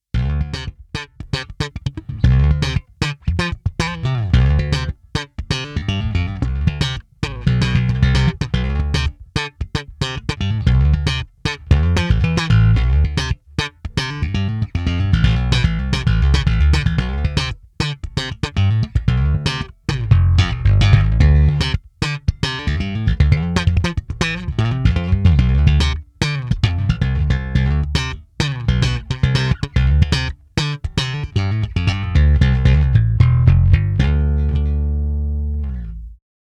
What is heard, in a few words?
EBS Classic 500 Slap